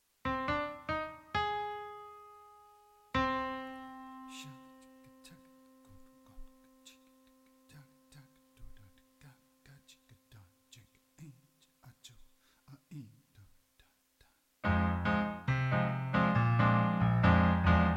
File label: inst bossa; Autumn - Inst. bossa K